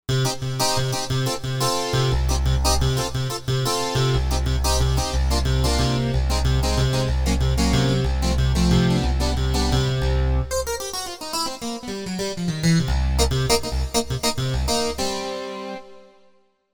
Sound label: Dig Clavtar